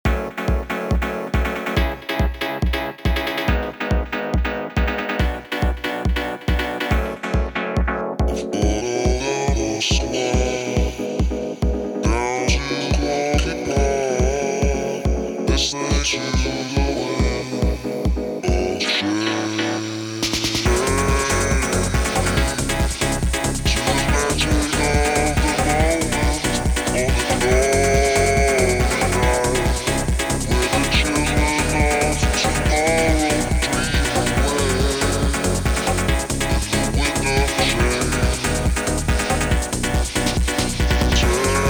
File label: tekkno